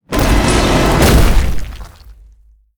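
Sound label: Mech Gorilla attack; monster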